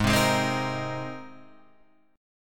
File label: G sharp Major Add 9th G sharp; G#add9 Chord {4 3 6 5 4 6} strummed